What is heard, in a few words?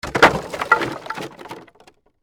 Crash Wood Debris, Crash, Wood, Debris, Drop, On, Wood